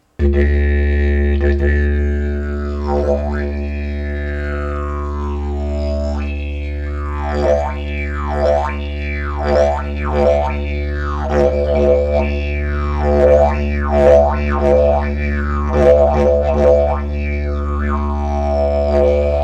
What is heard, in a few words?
Didje Bamboo